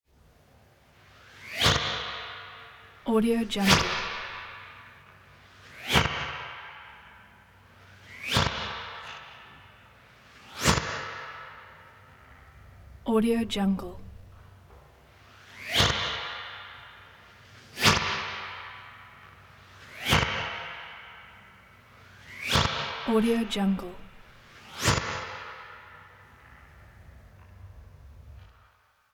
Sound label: space whip sucking bursts fwumpy slices echoey; prosoundfx